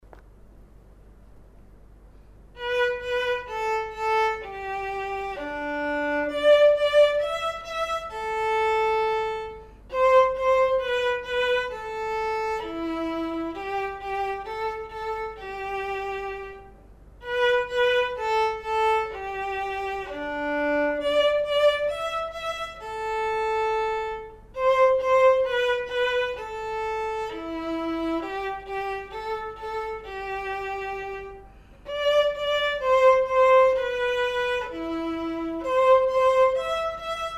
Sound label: perf vln